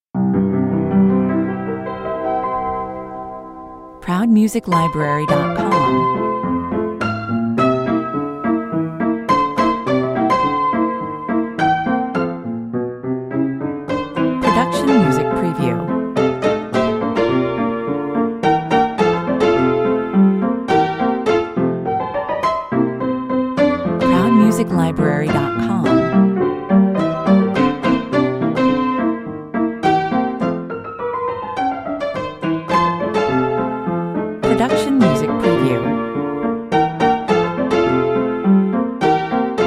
happy birthday to you, ragtime version

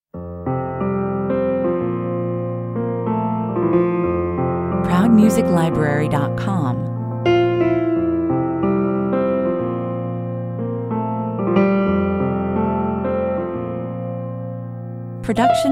romance 122 bpm

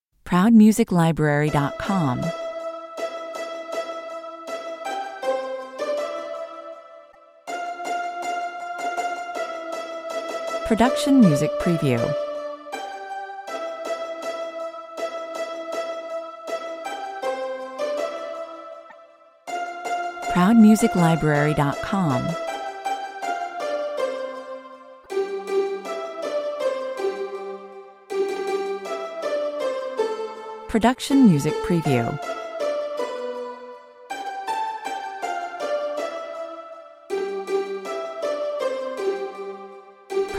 melody only

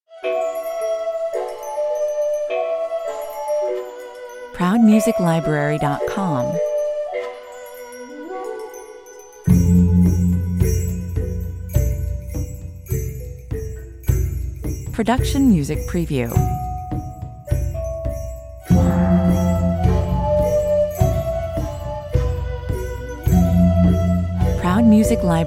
thai ambient, 104 bpm

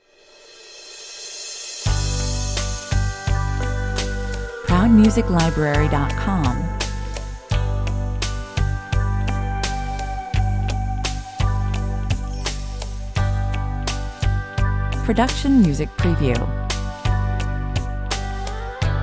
hopeless romantic 85 bpm

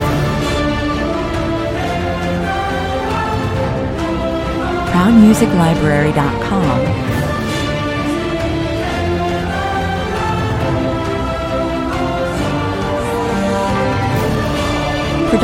with choir

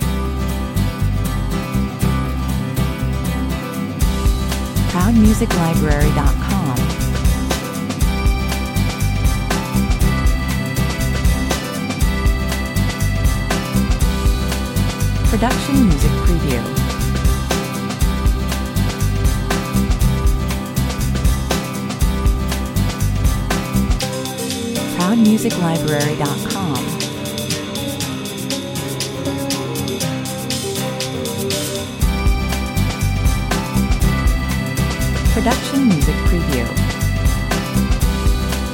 coming of age 120 bpm

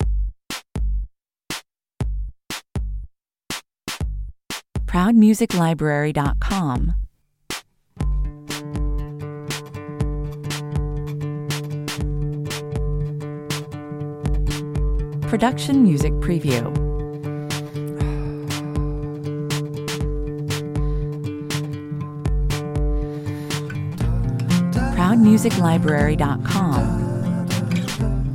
vocal vibes 120 bpm

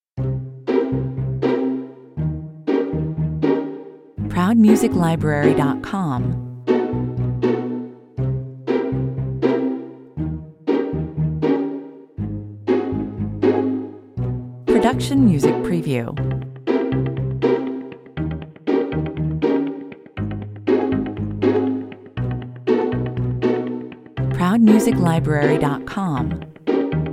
no lead drums

